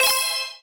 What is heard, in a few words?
Block Combo; Ui Block Combo4